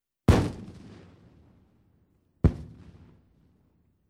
Protivotankovoe reaktivnoe ruzhe

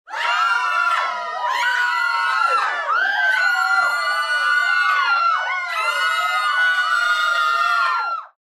krik veselogo ispuga

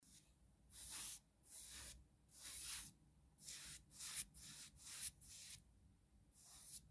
zvuk kistochki po bumage; Звуки кисти художника или маляра; звук рисования

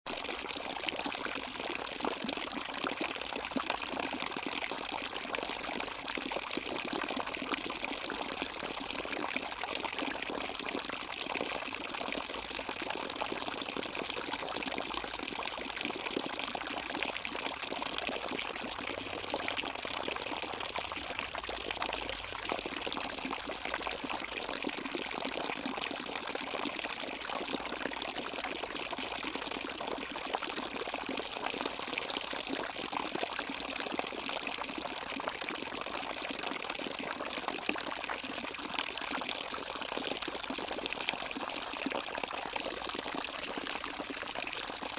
malenkij fontanchik vo dvore

